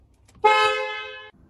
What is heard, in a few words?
Horn